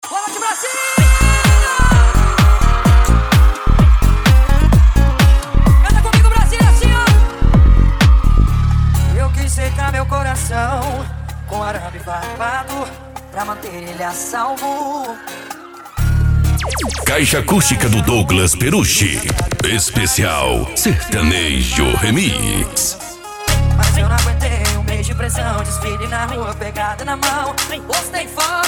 sertanejo remix

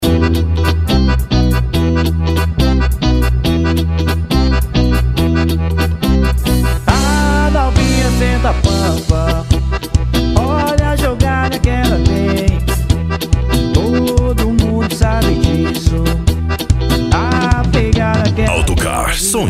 sertanejo e lambadao

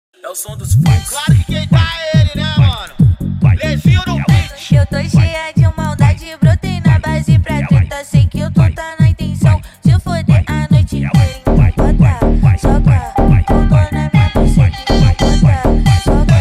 mega funk

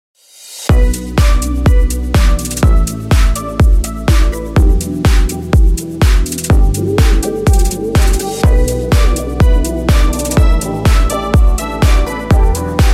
sertanejo remix

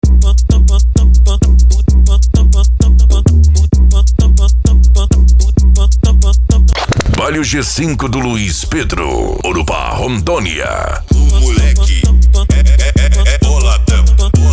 funk mega bass e forro; urupa ro

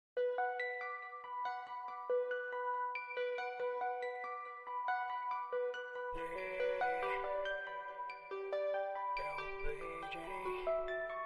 dub graves bass; rei do dub; blade h2

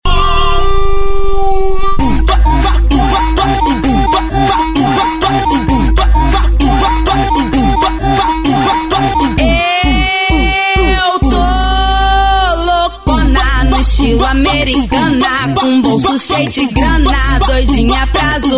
especial funk e electro house

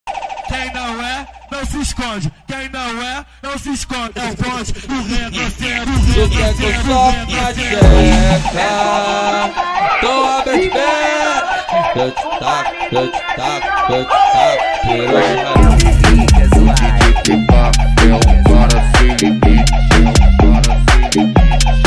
eletro funk; balada g4

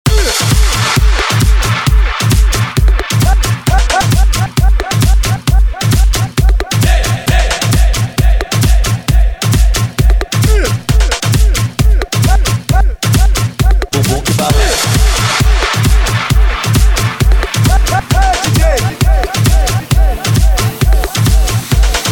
eletro funk

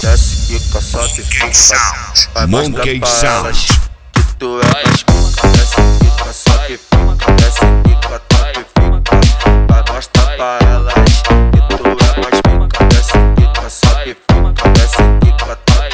esp balada

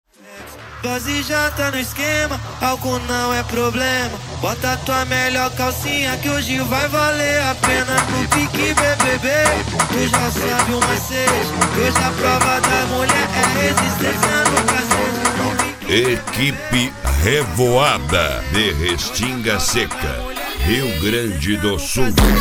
eletrofunk; cd equipe revoada especial fim de ano

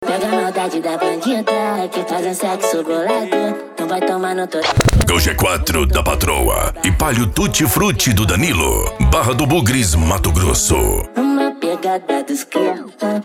funk mega bass e flash back

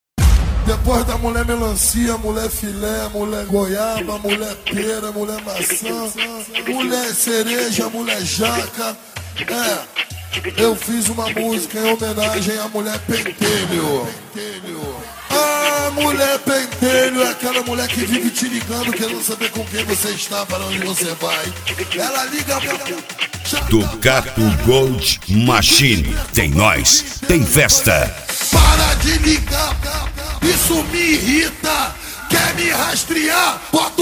eletrofunk

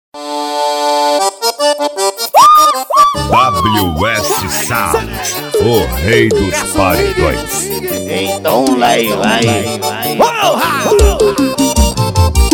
forró sampleado

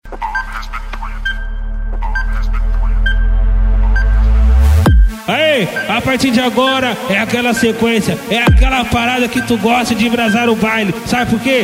automotivo; cd pick up desaforada 2024

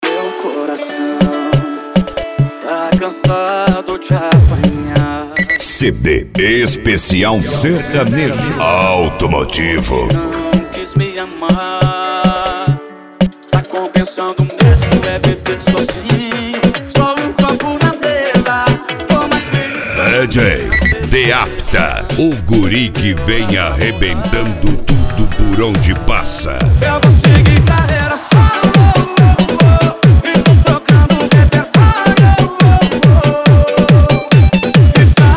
sertanejo automotivo

